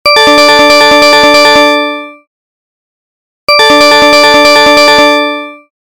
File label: ringer, tones; clock